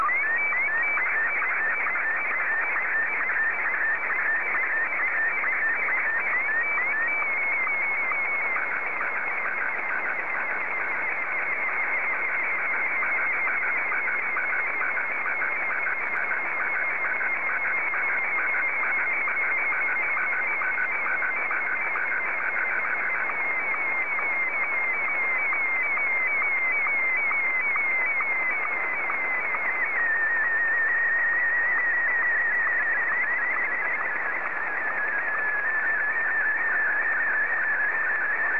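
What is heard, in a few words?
SSTV, Martin 1; ZL2TJM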